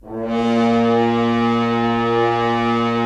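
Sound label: Solo French Horn, brass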